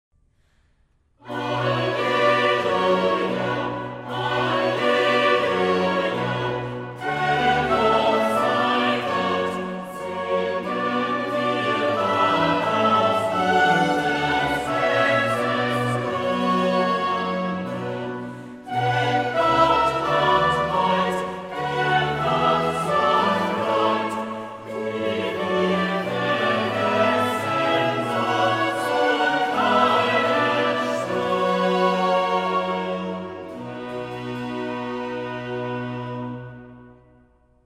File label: Bach Cantata 110 BWV 110, choir only